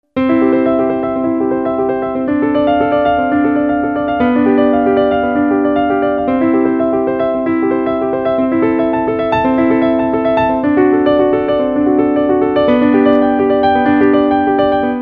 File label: piano prelude C; preludio C; J.S.Bach (1685-1750), Das Wohltemperierte Klavier